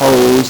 LSDj, Nintendo Gameboy; 300 Drum Machines; Ghetto

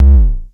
BASS HIT, Dual Percussion Synthesiser; Klone

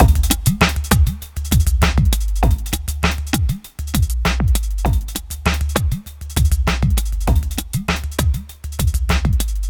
Ethnotronic, Processed Percussion

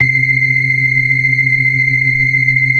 60 BLOOD R 1, ORGAN; Keith Emerson Signature Series

